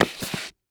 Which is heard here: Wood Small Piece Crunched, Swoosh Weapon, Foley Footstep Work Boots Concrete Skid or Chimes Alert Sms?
Foley Footstep Work Boots Concrete Skid